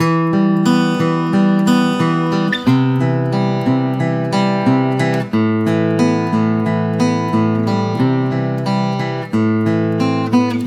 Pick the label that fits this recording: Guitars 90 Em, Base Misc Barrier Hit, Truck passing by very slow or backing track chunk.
Guitars 90 Em